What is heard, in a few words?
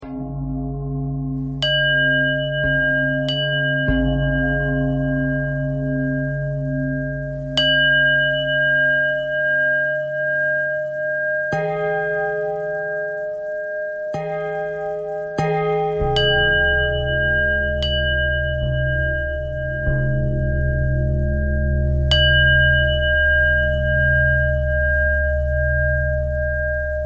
bell thread pentatonic